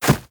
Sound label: Grass Run; moul-assets